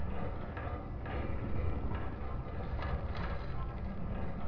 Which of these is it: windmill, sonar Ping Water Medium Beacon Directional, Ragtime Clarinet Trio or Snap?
windmill